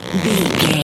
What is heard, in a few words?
foley leather stretch couch chair